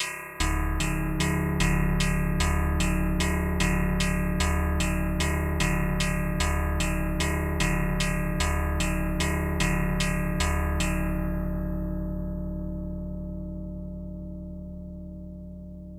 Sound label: tamtam